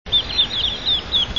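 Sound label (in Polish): nurogęś - młode, głosy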